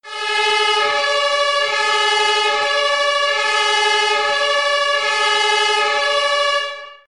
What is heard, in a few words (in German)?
sirene klingelton polizei feuerwehr and krankenwagen; feuerwehrauto